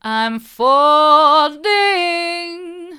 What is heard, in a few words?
i'm falling hard, vocal